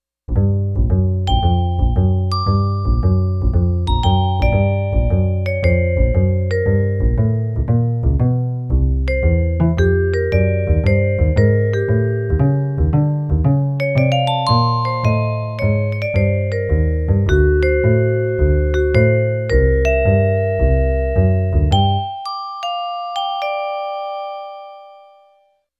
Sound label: Bass Vibe